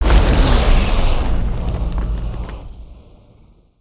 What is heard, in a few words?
Earth Rail Start